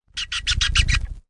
dark bats; snow white

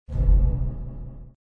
ominous short; snow white

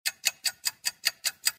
tick tock; alice in wonderland

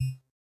hit; aliens

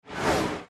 Match Made; disneyxd; bryx

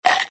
chomp; disney; mashup

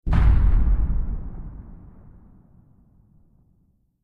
explosion; toy story; buzz

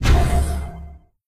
explosion; john carter; lost symbol

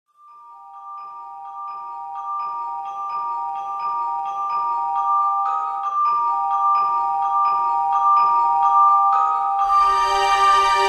loop; the wizard

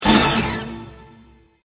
music stab

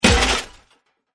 big crash; Wreck-It Ralph

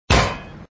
menu select; marvel; city under attack

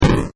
damage; Wreck-It Ralph

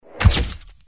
power bulb flash; disneyxd; stuntastic

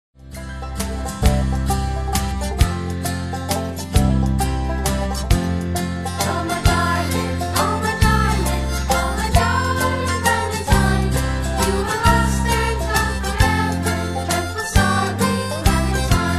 karaoke instr clementine; disney; childrens favorite songs

